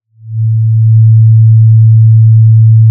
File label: Sound of a cruise ship horn